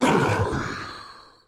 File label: groan, zombies